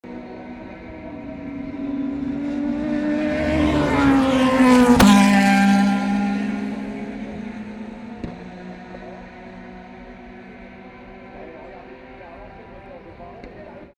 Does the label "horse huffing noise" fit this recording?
No